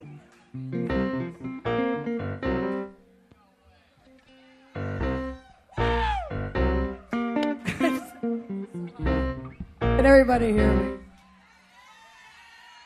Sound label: Tuning and Introduction